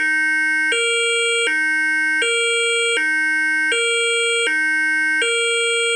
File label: Italian Police; Tonfolgen Hella; Dachbalkensystem RTK 7 Konfigurator